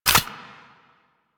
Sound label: Modular Secondary Handle Slow Reload, Guns